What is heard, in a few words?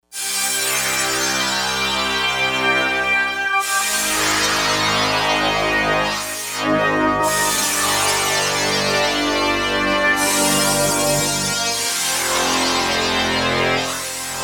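Fuzztones Stack; Digital Synthesizer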